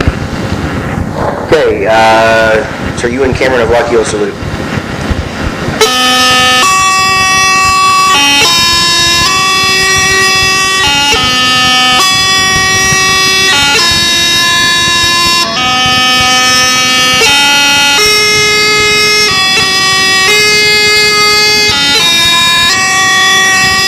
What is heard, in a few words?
salute, bagpipes; Sir Ewin Cameron of Lockies